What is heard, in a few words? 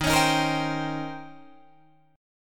D sharp 13th, Guitar, Drop D